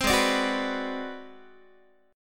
G sharp 7th Sharp 9th Flat 5th G sharp down Guitar, chord; G#7#9b5; strummed; JGuitar